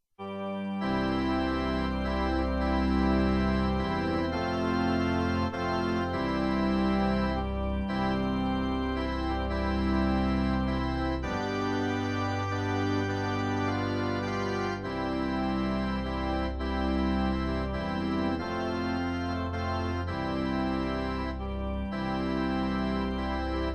church organ chords

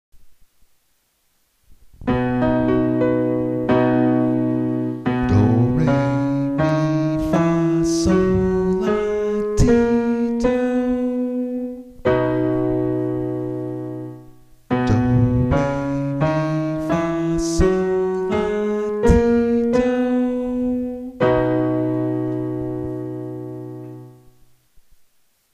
major scale do re mi fa sol la ti do

